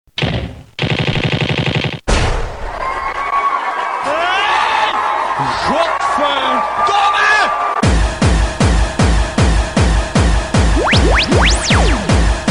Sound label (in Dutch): Kermis Jingle Mix; Kermis Mixen